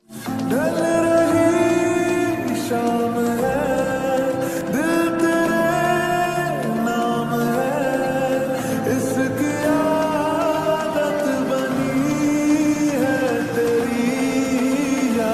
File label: lofi slow reverb love